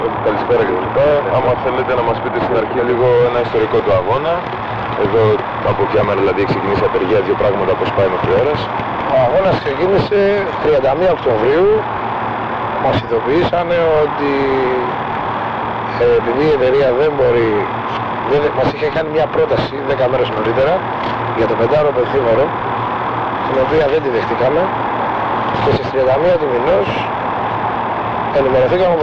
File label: noise